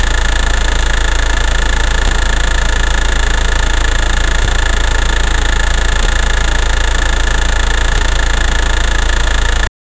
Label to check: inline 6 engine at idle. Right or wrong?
Right